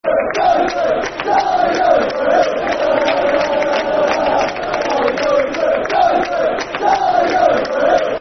Town Are Going Through, Football Chant; Grimsby Town Gtfc

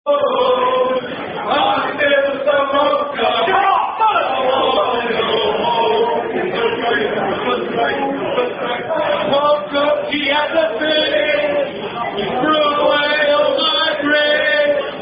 Sing Song At Rugby Club; Tonbridge Rugby Club Footscray

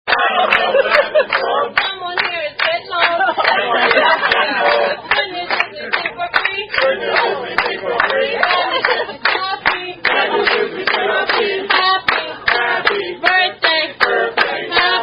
Birthday Song, Happy Birthday; Happy Birthday (Tune Sound Off) Unknown Restaurant

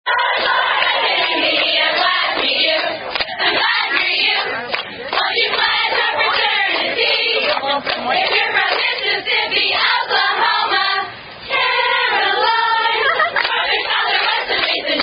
Sorority Song; Phi Mu Osu 2008 Bid Day Song

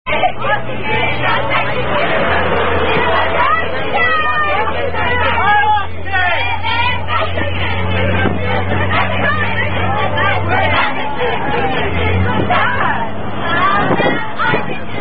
Shark Song

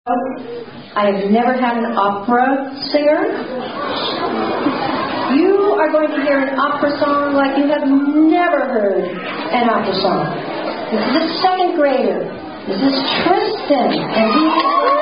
opera; God Bless My Underpants

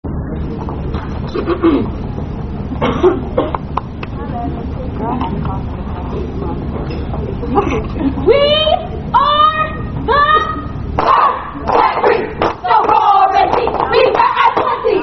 Alpha Kappa Alpha Chant; Aka Step...a Serious Matter

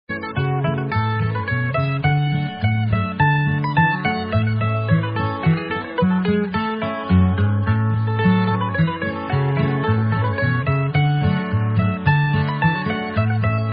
Instrumental

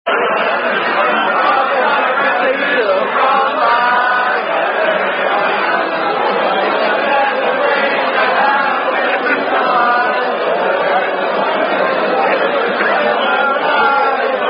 Sing-A-Long, Rugby Song; Bingham Cup 2008

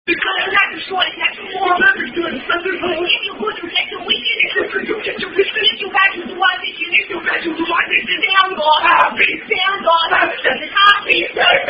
Birthday Song Fun; Tune Sound Off

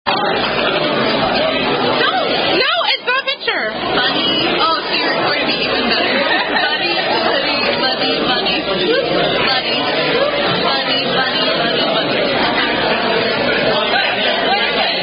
Bunny Bunny Swoop, Unknown Finger Game; Super Summer

